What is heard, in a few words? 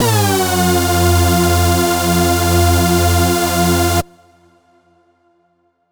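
bass sustain F; Polyend; ARC Noise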